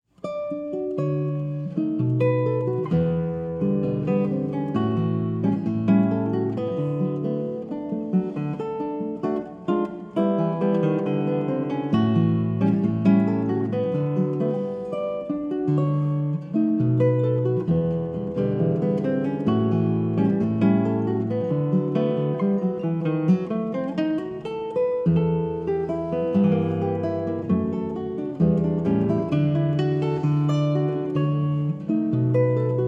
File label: violao violao